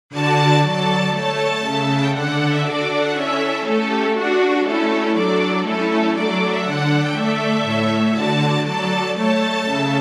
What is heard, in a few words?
Trumpet Tune